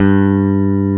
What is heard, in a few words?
E BASS PH G1, Pick Bass